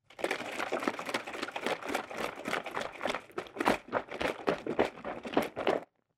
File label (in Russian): bultyh